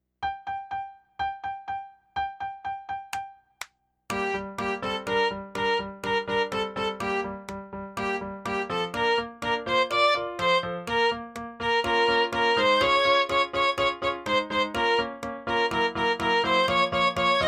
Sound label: Violin 124